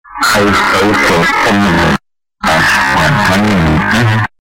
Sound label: alto; Alto può scendere…Pasqua è ccà, han vita!